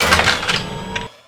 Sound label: Gun Draw Select Scifi, Weapons Foley; SFX Kit